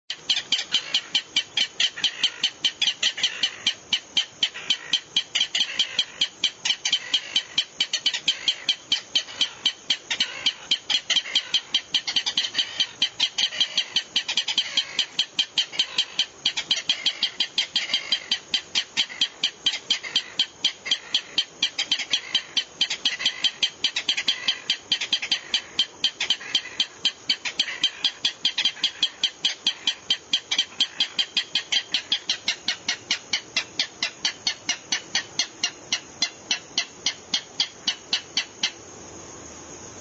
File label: Rallus longirostris